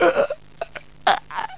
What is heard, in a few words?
BREATH